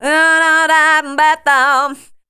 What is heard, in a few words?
RHY LINE, Voice Spectral; RHYTM